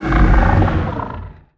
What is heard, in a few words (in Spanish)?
Fantasma; TRORB